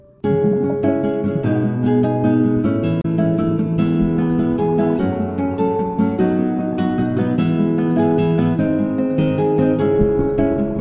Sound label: Acoustic Guitar Cover